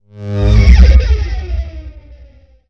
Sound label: Shock Wave Synthesis